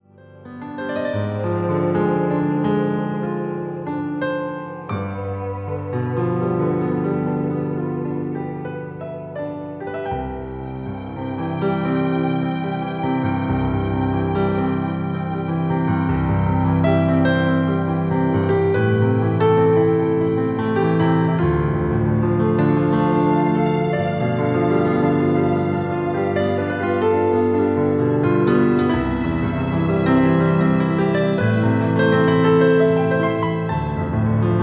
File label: ARIA piano fragm